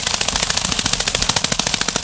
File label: fly